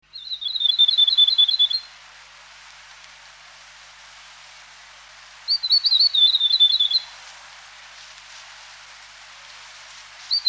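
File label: Tomtit, Bird Calls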